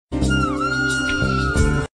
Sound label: Sms Tone